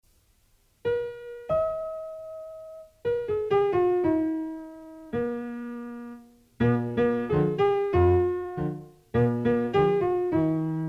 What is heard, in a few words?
piano; klaskany